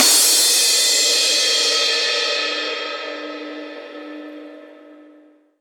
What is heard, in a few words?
Crash, Cymbals; Vengeance Samples; VEH2